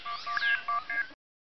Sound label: Digital Keypad